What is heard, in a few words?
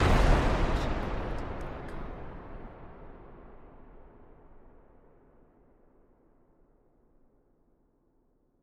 Explosion Blast; SoundsCrate